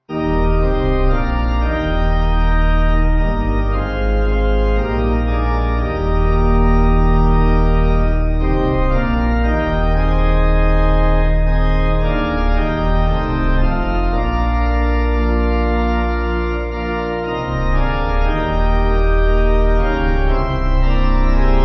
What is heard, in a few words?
Pipe